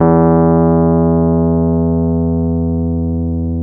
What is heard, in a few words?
RHODES EP2 E1; Sonic Reality - Vintage Timetraveler